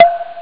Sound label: drip; ST-XX Amiga Soundtracker Sample Packs